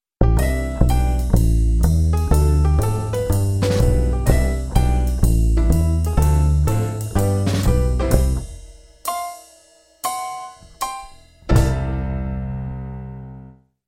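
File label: instrumental jazz ringtone; instrumentalnyj dzhaz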